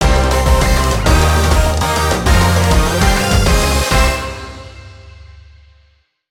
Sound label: Music event; Power Pro Kun Pocket R